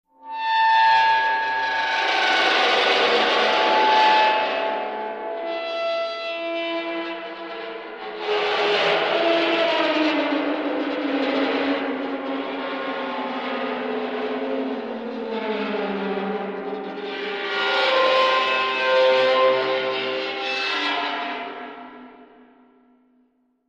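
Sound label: Dry Ice Eerie Talking, dry ice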